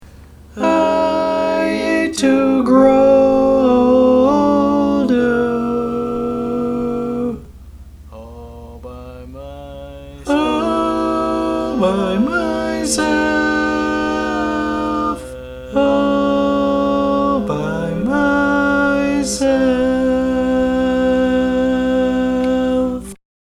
Lead, barbershop

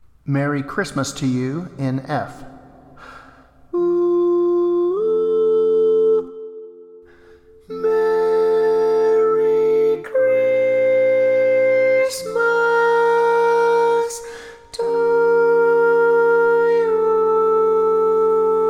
Tenor, barbershop

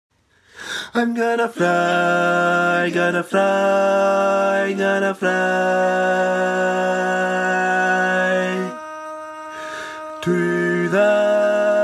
bass

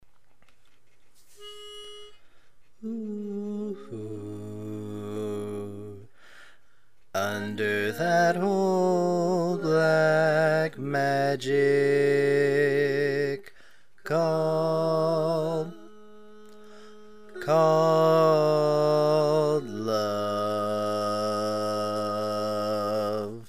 Bass, Barbershop Tags

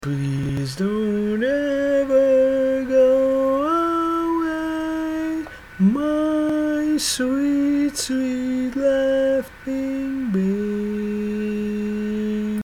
Lead, barbershop

